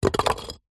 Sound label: foley concrete block drop on ground; zapsplat